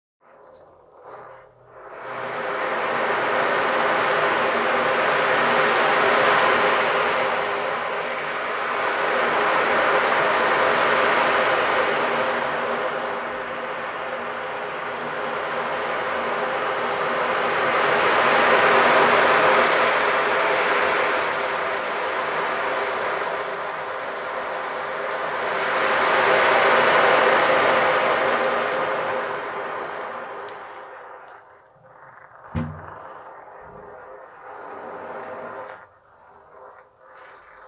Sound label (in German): Ocean Drum sanftes Kippen